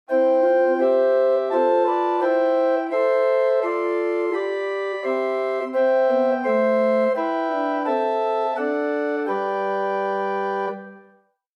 Hw Oktave4; Grace Lutheran Champaign

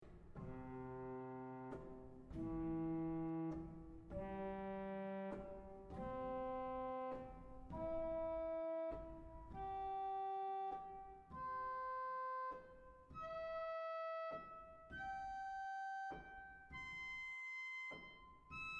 Celeste arp; Mainz

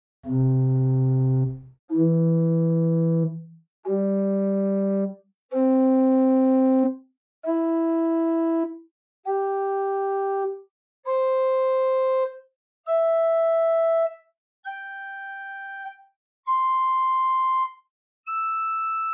Prestant 4, organ; Buzard Opus 3

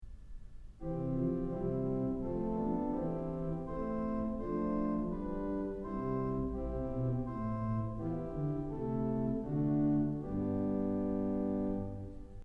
Diapason; Kellogg Auditorium

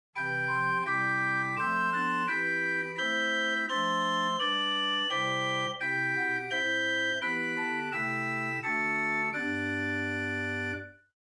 Gedackt Quinte, chamber organ

